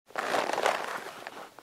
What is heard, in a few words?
other equip, clothing system